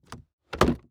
Industry Open Toolbox

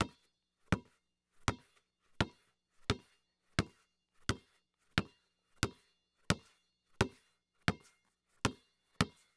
Sports Ball Basketball Dribble On Cement Floor

